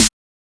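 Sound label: HOUSE SNARE DRUM; E-Lab; X Static Goldmine 1; akai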